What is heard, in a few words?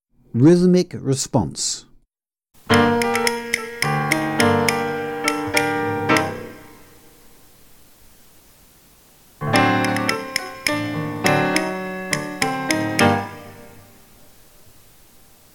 Rhythm response, tap